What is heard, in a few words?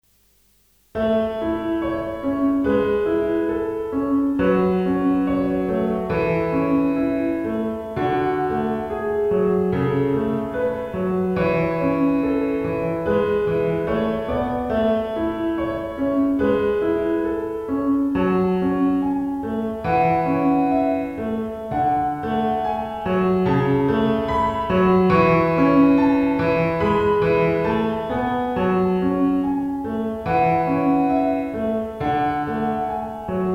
piano pop